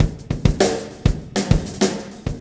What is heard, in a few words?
Wormhole Beat, Processed Drumkits